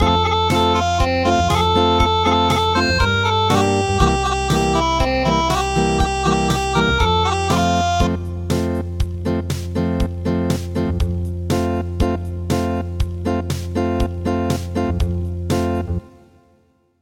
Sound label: Acoustic